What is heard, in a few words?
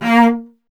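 strings cello A#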